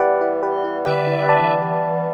Pad; Sonic Foundry (Sony Creative Software); Synchro Funk

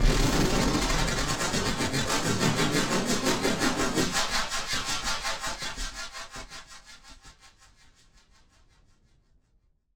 Battered Synth; malfunctioning synth samples

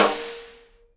snare RIM RING, Live Snares; E-MU Producer Series Vol. 8 – Platinum Phatt (CD 1)

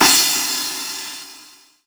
Big 707, Drum Hits; Zero-G; Soundclash

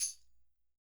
tamb, PERCUSSION

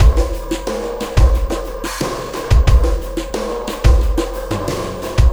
Sky Lab Beat, Processed Drumkits

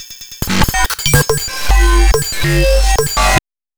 glitch, bass

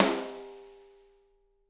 SD, Drums; Bob Clearmountain Drums I

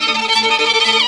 VIOLIN LINES; Best Service; World Colours

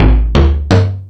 Percussion, Drum Machines

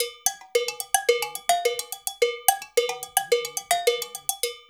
LOOP, Percussion; InVision Interactive; Percussion Slam

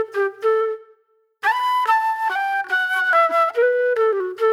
Fusion Flute; Sonic Foundry (Sony Creative Software); Manhattan Flutes; Garage Funk

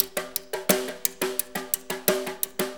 Brush Perc, Perc Loops; Drum Tools

